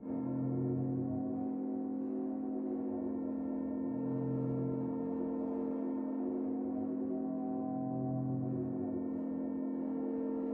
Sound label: editor drone; Source Engine; Portal 2